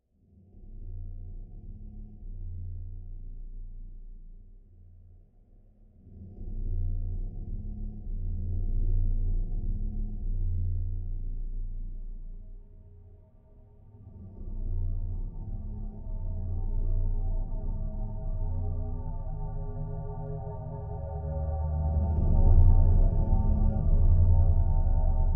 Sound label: Post-Apocalyptic Dark Ambient Mix